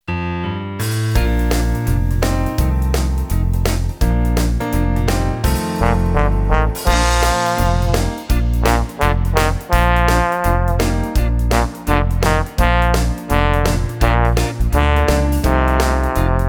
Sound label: trombone